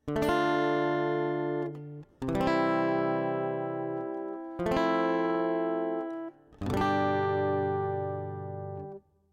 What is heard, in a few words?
Chords